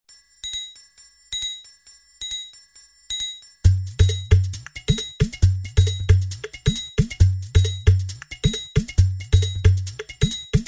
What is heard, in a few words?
Bangla Tone